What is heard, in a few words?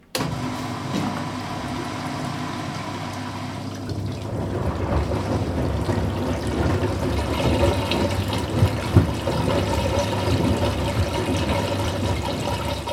Washing Machine Rinse